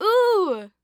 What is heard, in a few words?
Human Female Ooh Surprised Happy Ooh